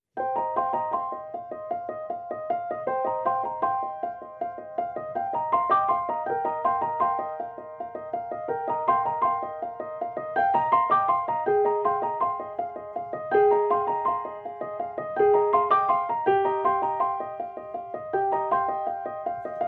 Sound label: Piano